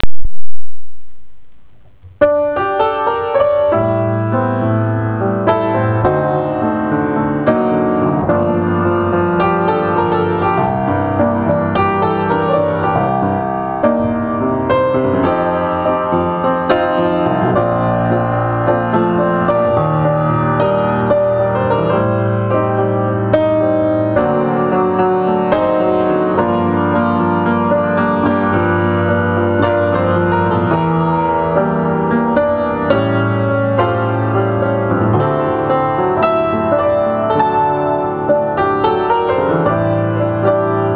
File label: Piano Composition